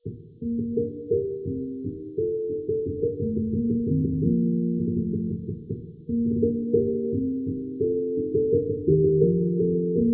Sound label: piano 1kHz; 16bits